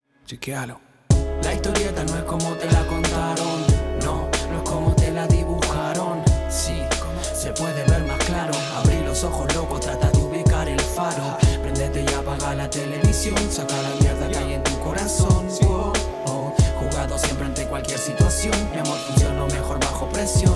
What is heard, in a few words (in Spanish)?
rap